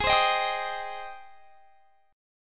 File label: Ab Major 7th Ab down; AbM7 strummed